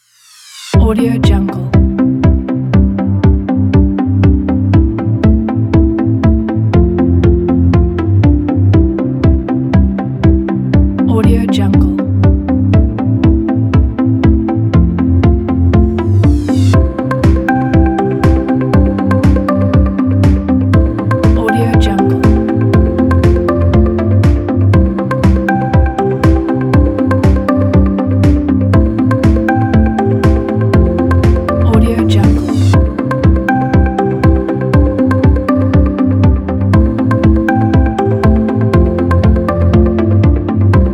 piano corporation; trinitymusicproduction

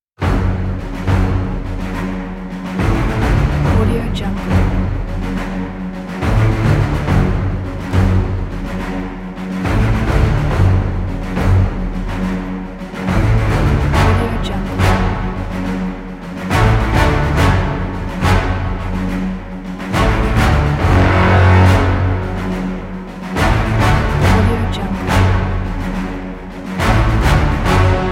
dramatic suspenseful orchestral action trailer

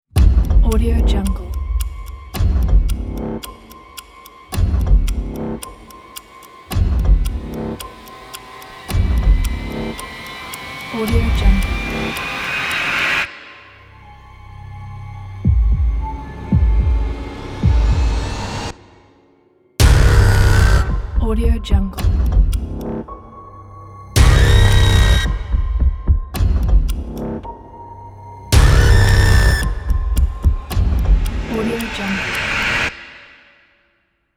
horror trailer; goodideaproduction

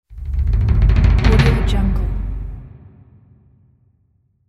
taiko roll low

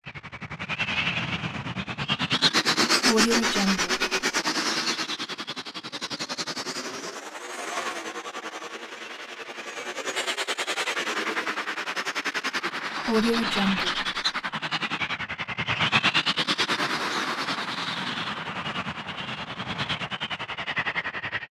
wooden blades fly

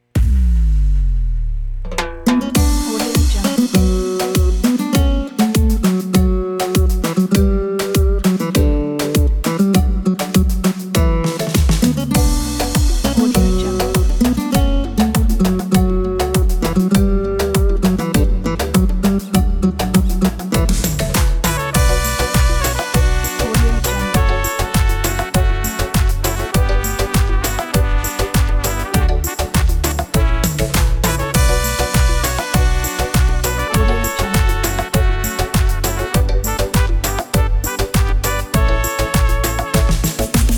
caribbean catwalk

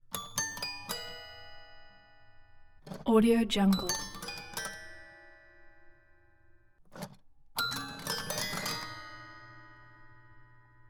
toy piano randomly hitting multiple keys

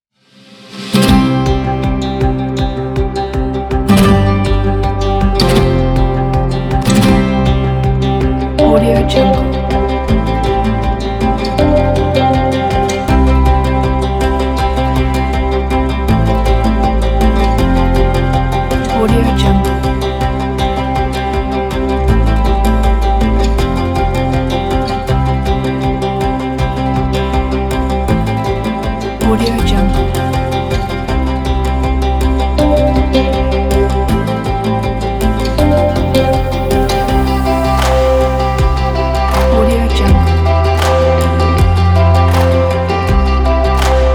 corporate folk

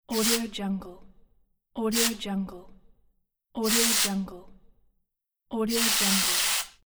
compressed air

